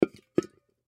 Soda Can Full Set Down; Foley; Set Downs; Objects; Blastwave

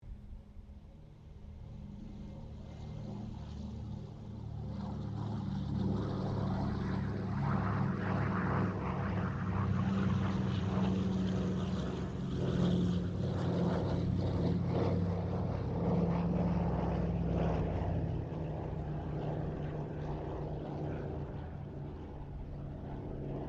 AIRCRAFT DC3 SQUADRON 2 TAKEOFF; Airplanes; Sound Effects:Vehicles

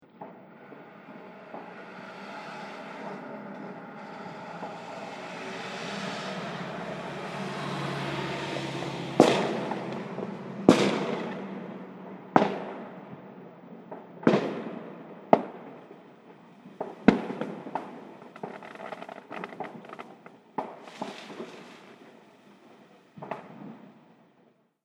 Fireworks Car Followed By Fireworks; Andrew Fleming

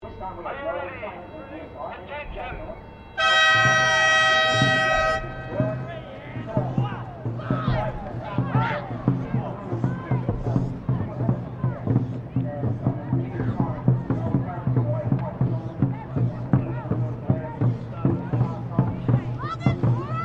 Boats Dragon Boat Race; Dragon boat racing; Boats (Sound Effects:Vehicles)

